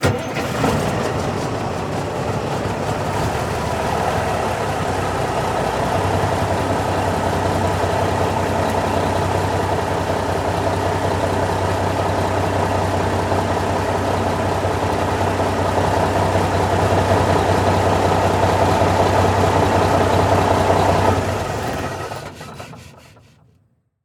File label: Semi Truck Engine; Large Vehicles; Blastwave